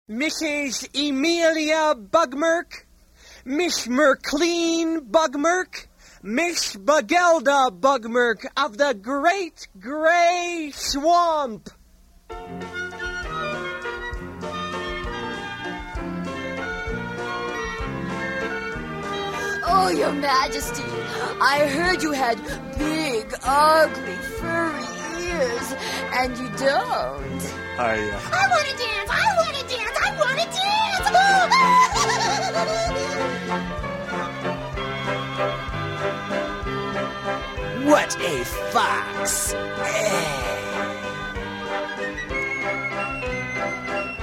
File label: Waltz